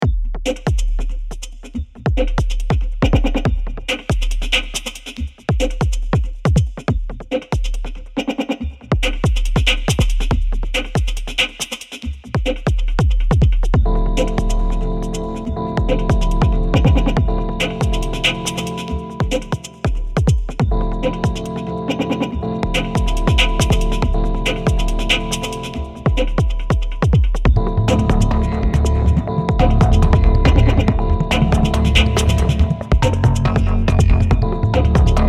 drums and some stuff

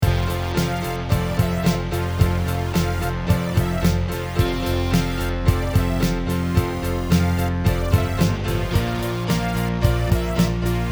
new wave anthem; contrails; weeklybeats-2016

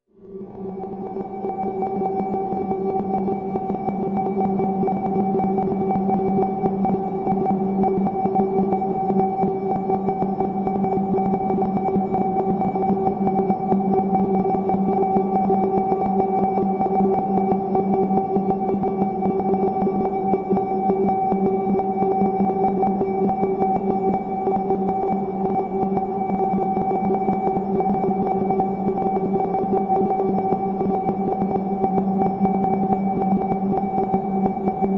ambience

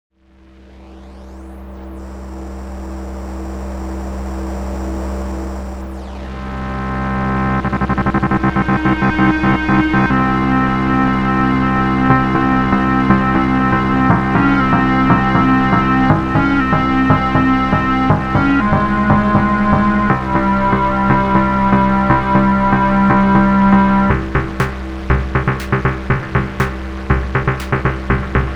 meh techno; weeklybeats 2024

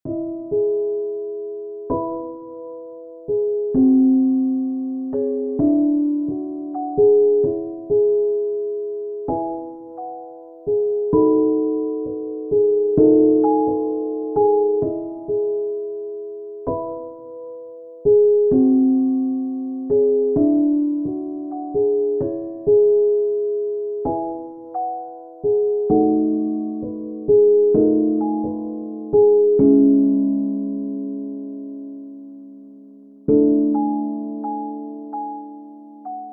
small piano melody; weeklybeats 2024

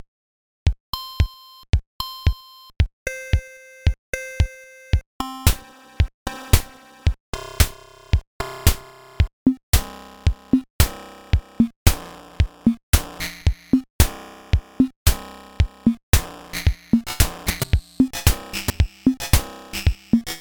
build up opll; weeklybeats 2024

